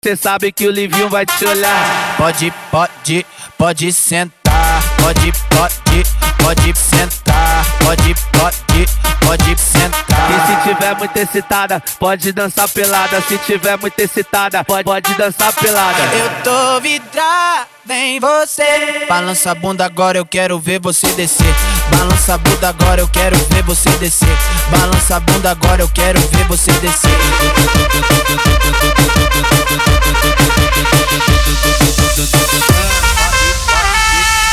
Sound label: Brasilero Moombha Transition